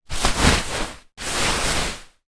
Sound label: large bean bag movement